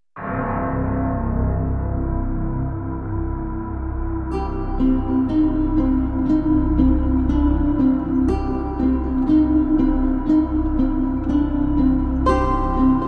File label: Ominous Pads and Guitars; Drama, Mystery/Suspense/Thriller, Atmospheric, Ominous; Electronic/Rock; Making a Murderer